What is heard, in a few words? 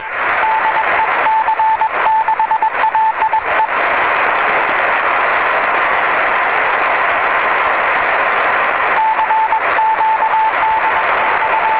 cw